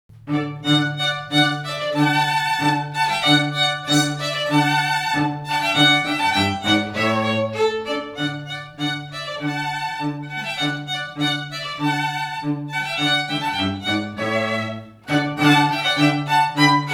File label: String Trio; Vivaldi Spring 2